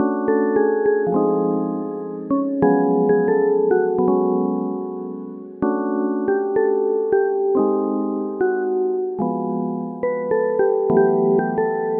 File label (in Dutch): Eerste versie melodie